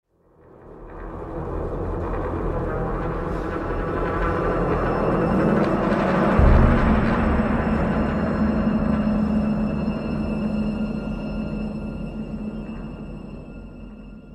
Suspense Theme

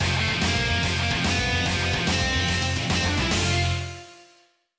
Jingle Map Clear

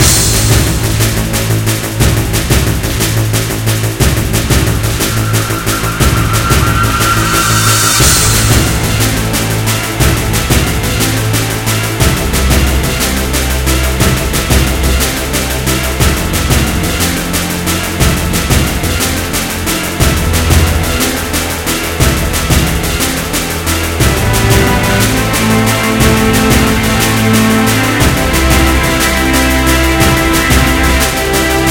Military Dog Fight Loop Boss Fight; Galactic Storm

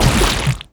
cannon pie; Ringling Bros. and Barnum & Bailey; Wii

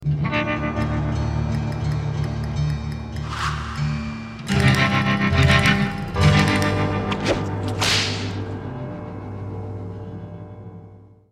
countdown; Secret Ponchos

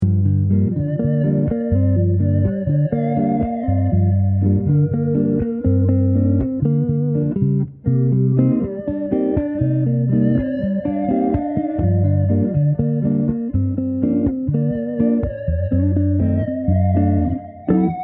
Bossa Crocha Loop; Utterly Threadful

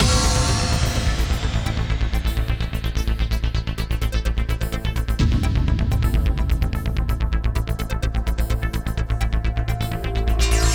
Music Loading Growhouse

